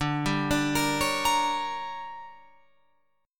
D Major 7th Suspended 4th Sharp 5th, Guitar Open F; DM7sus4#5 arpeggiated